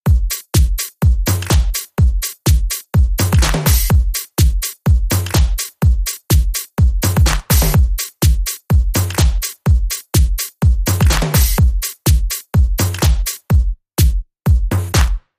Bi Lectro Beat

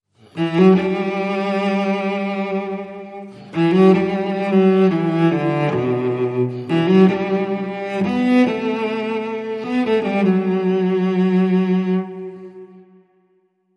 Cello

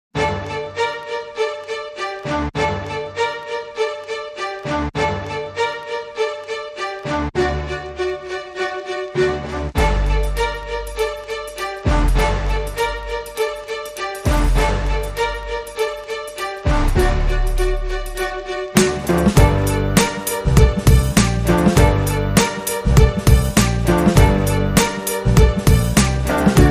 No High Strings

